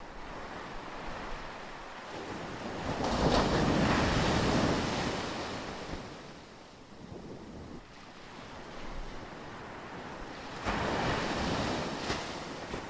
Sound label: Sound of Sea Waves